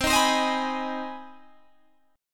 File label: C Minor Major 7th Sharp 5th, Guitar, Open G